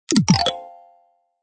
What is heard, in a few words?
key grabbed